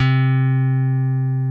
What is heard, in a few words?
C3 F. BASS; Keith Emerson Signature Series